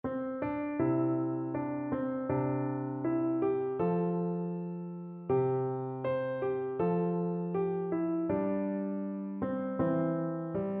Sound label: sally gardens piano